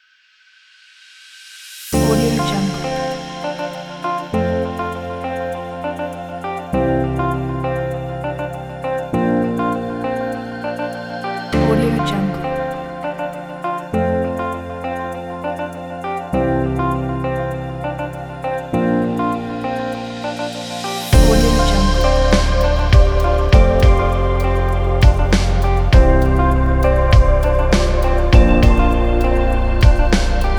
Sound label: ambient music